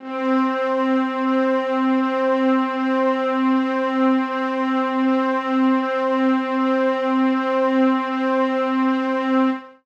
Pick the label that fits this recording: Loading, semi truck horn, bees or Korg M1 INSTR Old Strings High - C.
Korg M1 INSTR Old Strings High - C